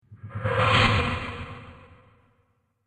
Staged Swoosh Fast; Whoosh Sound Effects; sound design

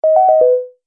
Multimedia Event Notification, beep sound effect; Andrew Fleming

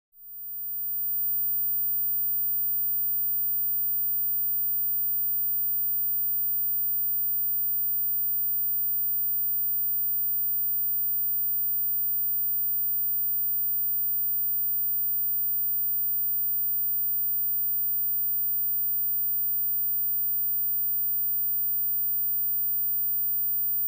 Science Fiction High Pitch Tone Monitor; Battle

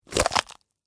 Crunch Green Pepper, Rip, Open, Vegetable; Texture; Crunches; BlastWave

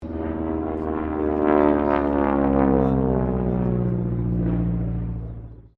AIRCRAFT AT-6D HARVARD MK III PASS; Harvard Training Aricraft; Airplanes; Vehicles

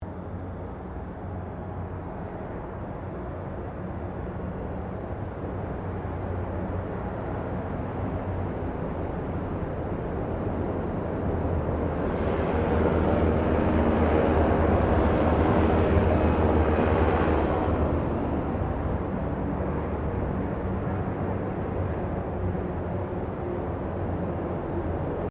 HELICOPTER 1990 SIKORSKY UH60 BLACKHAWK PASS; Helicopters; Vehicles; Aircraft Collection

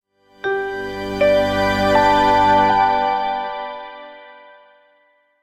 multimedia logo, Musical Identity/Signature; Short Musical Signature / Ident; Sonic Logos; Sound Design; McKinney Sound

